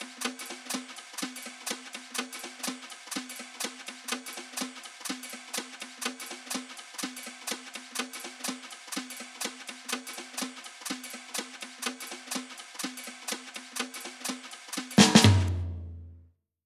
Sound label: shuffle beat